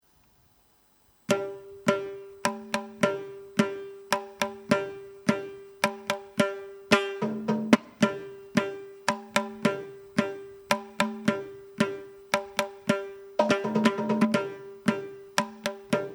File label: tarang thisra nadai, carnatic music